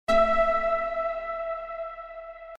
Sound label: HAARP SYNTH 96BPM; One-Shot Synths; Crowdsourced Sample Pack; TRUTH-HZ